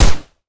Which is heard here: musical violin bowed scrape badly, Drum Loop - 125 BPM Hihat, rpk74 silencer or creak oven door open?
rpk74 silencer